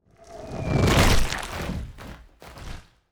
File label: falling bodies; Stellaris; strange worlds